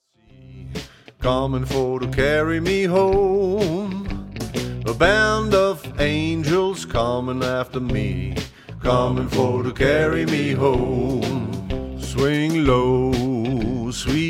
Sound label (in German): ohne ukulele